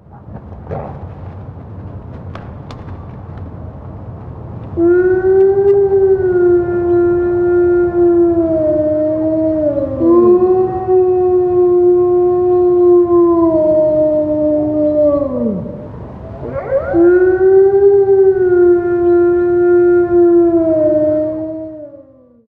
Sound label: wolf lonely; animals; best service Blue Box